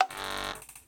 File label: Turret See Player